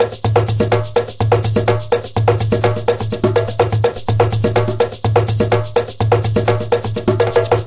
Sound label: brazil shaker drum, drum loops and breaks